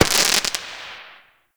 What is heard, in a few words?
Firework Crackle; Fireworks; Warframe